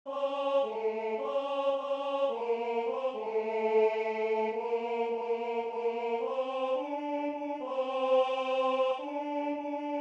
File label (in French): ténor